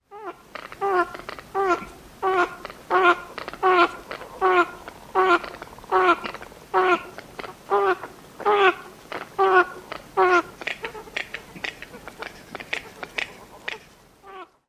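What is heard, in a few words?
male and female calls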